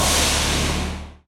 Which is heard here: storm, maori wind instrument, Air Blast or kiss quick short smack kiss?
Air Blast